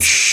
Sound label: thin open hi hat d key